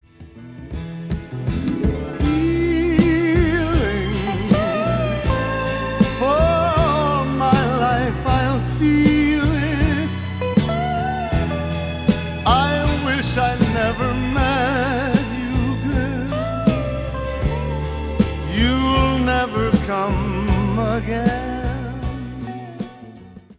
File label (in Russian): припев